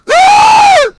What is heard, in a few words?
beast roaring angrily